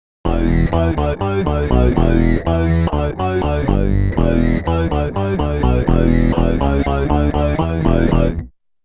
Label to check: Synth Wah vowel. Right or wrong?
Right